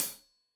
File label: Hat Tight, Hi-Hat; Deeply Sampled Drums